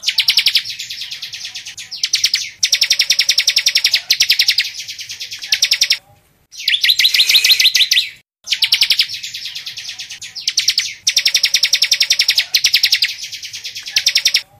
Suara Burung Ciblek Ngebren Versi Pendek

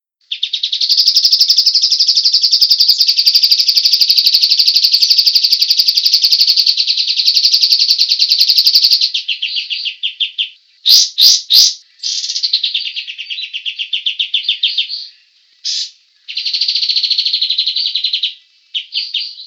Suara Burung Kapas Tembak untuk Masteran Kicauan Rapat

